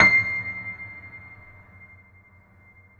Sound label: PED MF, GRAND PED